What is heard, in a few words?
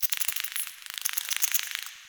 Interior Clicky Critter, Infested, Ambience; Misc Indoor